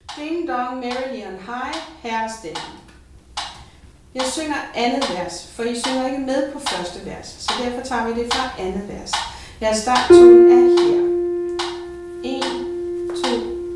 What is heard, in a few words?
Ding Dong Merely On High